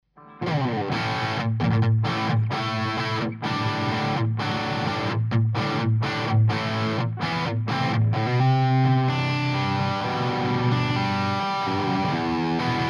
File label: EHX 5150 Clean Overdrive L3 SM57